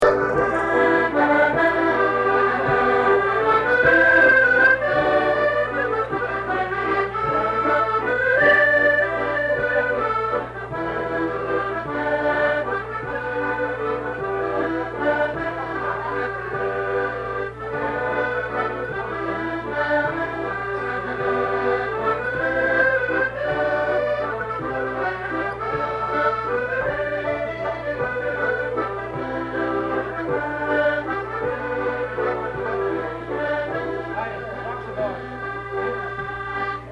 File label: Waltz